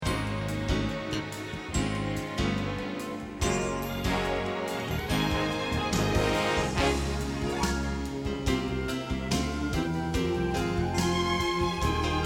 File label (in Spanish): Bajo